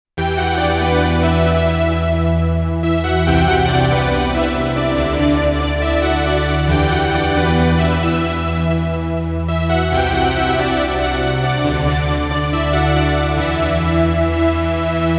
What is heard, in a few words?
modal electronics cobalt5s